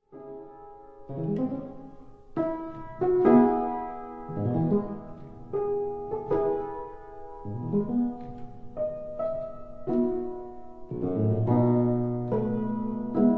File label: Etudes for piano C sharp minor